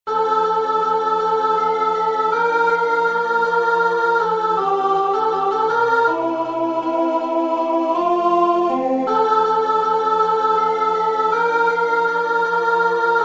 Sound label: Alto